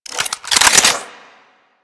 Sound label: Reload Clip In; Warframe; Tenno AR; Prime Tenno AR